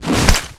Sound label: Enforcer Close; Requests Studio; UT3